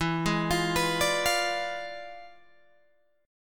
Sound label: E 7th Flat 9th Guitar Open G, chord